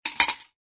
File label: coins use